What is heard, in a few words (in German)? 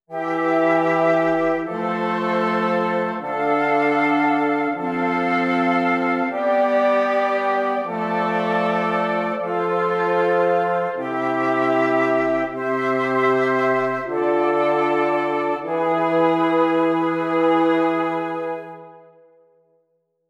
Stimmiger Contrapunkt; Ronge